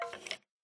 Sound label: skeleton, mob; minecraft pe 0.6.1